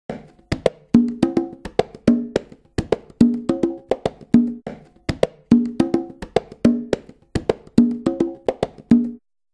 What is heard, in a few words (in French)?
test percu; magix; packplug